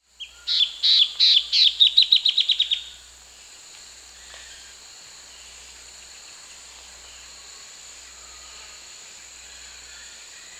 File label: Striated Antbird (Drymophila devillei), Peru, Birds of the Amazon; S. America